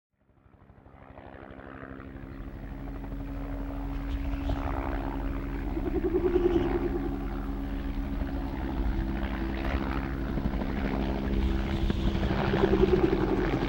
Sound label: Wind Planes